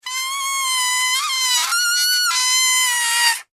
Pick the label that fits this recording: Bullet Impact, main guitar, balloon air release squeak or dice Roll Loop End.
balloon air release squeak